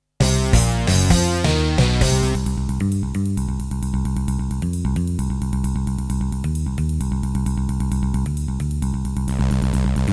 Yamaha MU2000EX GS MODE; sigil doom episode 5

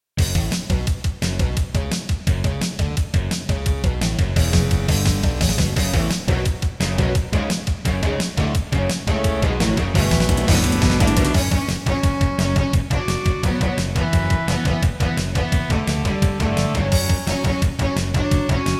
Intro, Yamaha QY70; Duke Nukem 3D

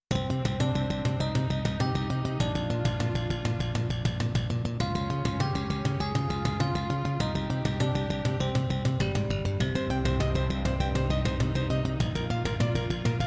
Roland JV-1080; Hocus Pocus

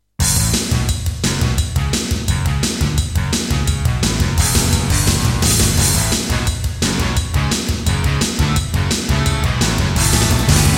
Intro [Yucatan FX (Turtle Beach Cancun FX Clone)]; Serdaco; Duke Nukem 3D

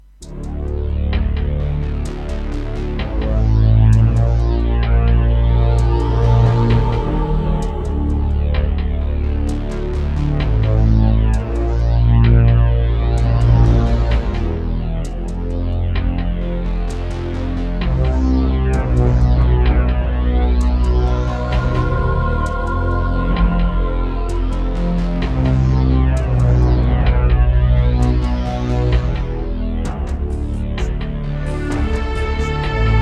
Roland SC-33; Descent II

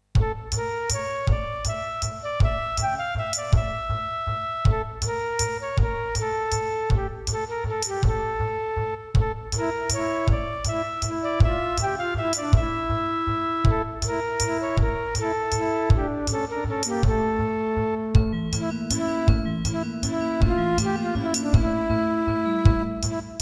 Casio CTK-2100

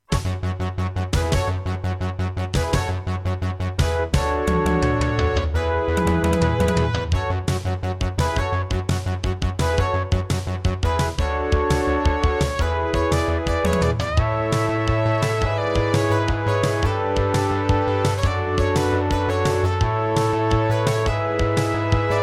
Intro, Roland SCB-55 MT32 Mode; 4D Sports Boxing

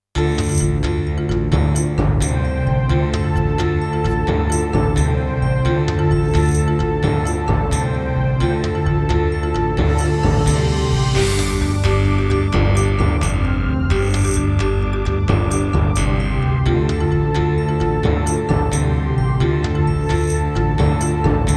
Roland SC-88; Heretic

